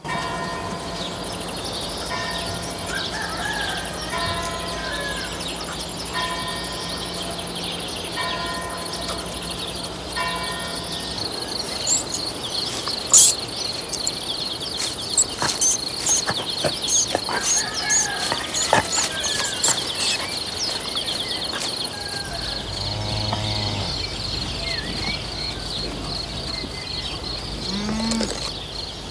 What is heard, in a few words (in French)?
Campagne jour ete